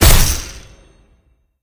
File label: hit; Smite; Tiamat